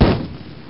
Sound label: rocket launcher firing